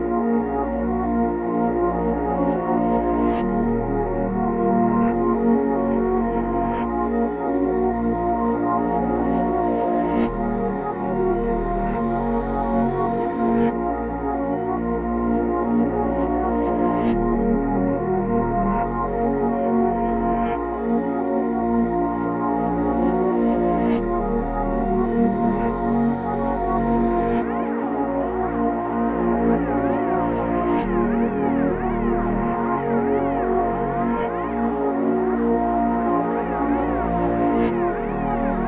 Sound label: 140 BPM A# Min, Reverse; Cymatics; 2022 Melody Collection